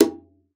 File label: Conga; ProSamples vol.42 - Session Instruments